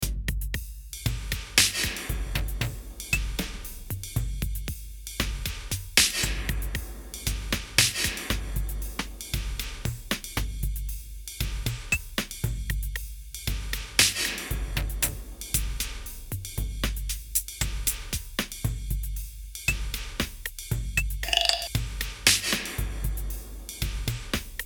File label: drums